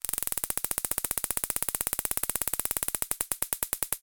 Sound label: warfare taser gun